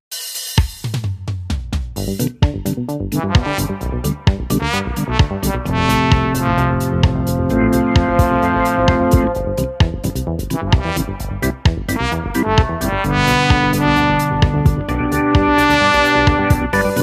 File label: mellow fruit